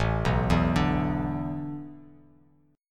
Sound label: A Suspended 2nd Suspended 4th, Bass Standard; Asus2sus4 arpeggiated